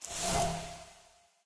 brush; smite; zhongkui

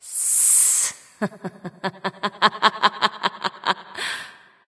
laugh; smite; arachne

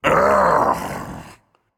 ugh, voicepack; smite; jorm

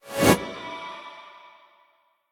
basic swing; smite

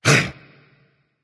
grunt jump berserker; cuchulainn

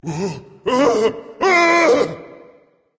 fear berserker; cuchulainn

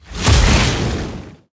cast fire; smite; god merlin

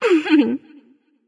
heh heh light; smite; voicepack; hel

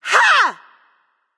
battlecry light; smite; voicepack; hel

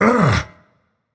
notice; smite; voicepack; ra

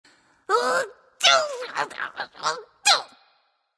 grumble; smite; voicepack; thanatos

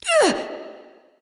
grunt hit ghost; smite; voicepack; hel

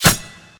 hit; Smite; god Khepri

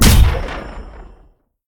stun; smite; lancelot

